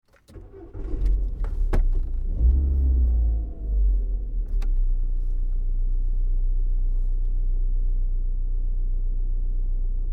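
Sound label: car drive internal; spoteffects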